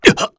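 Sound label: Vox Damage, Korean; Lusikiel; kingsraid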